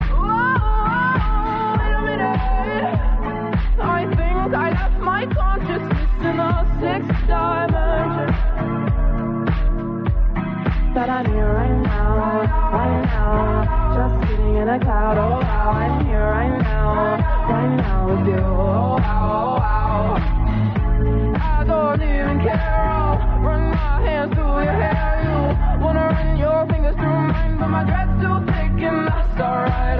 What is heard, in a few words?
lofi english song